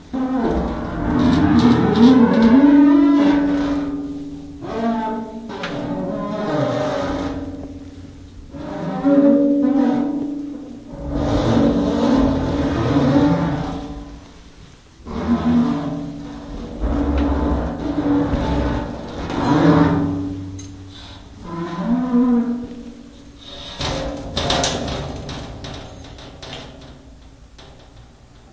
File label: creaky grinding; Sound FX Real Life